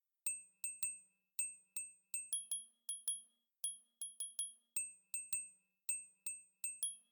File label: foresty xtra perc; action RPG game